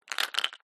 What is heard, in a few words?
batteries aa handle dense click rattle; skyclad sound